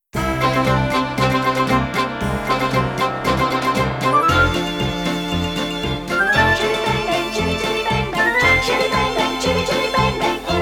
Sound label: Instrumental plus backing